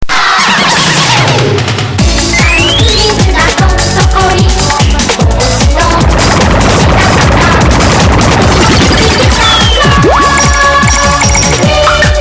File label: theme